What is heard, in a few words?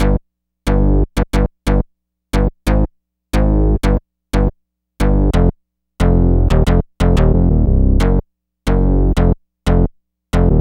Purple Bass; Dr. Fink's Funk Factory